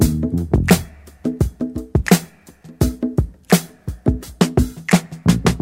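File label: Hammond rif, Right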